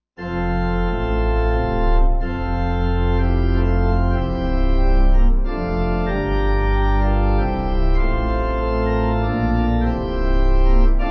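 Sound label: pipe organ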